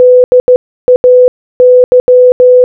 "DAY" Morse Code Audio

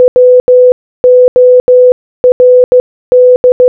WORD Morse Code

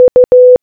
U Morse Code

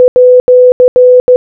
@ Morse Code Audio

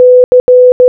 C Morse Code

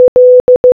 L Morse Code

